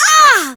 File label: Pain, Barks; Kuva Lich; Persona B